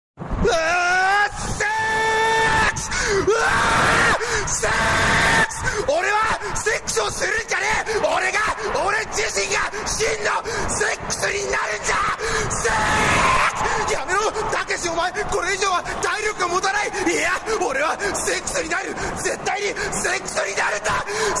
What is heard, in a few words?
japanese guy screaming in tunnel